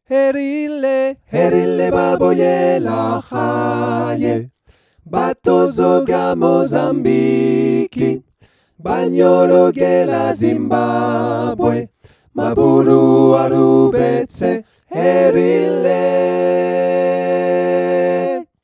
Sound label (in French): 4 voix